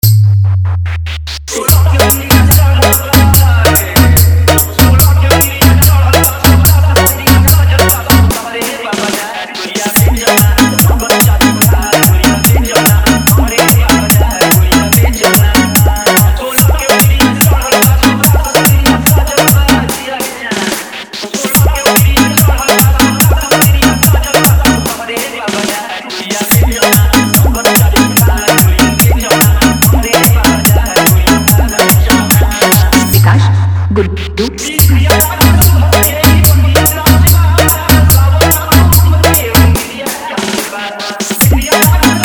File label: Bhojpuri Remix Song